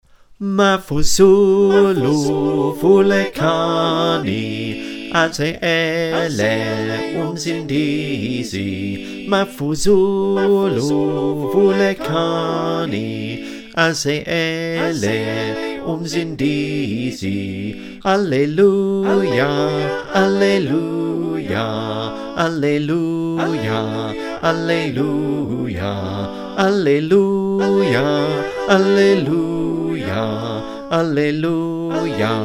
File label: Bass, choir